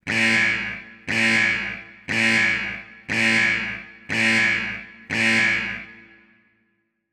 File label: Tension Builder